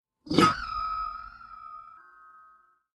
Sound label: Teleport